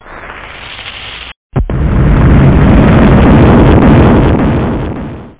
Long Boom

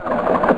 stop tank; M4 7.5.2; M4™ (PowerMac)

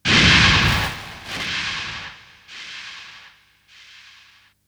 inhale, Vocal Samples

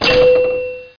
arcade; Bible Fun Pack; Education Publishing Concepts; BIBLELND

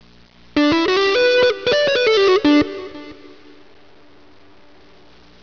elec guitar

